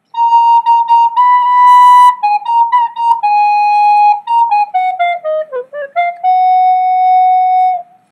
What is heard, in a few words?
aum aparajitaya recorder